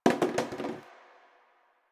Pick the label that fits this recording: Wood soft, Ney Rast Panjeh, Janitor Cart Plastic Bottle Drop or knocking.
Janitor Cart Plastic Bottle Drop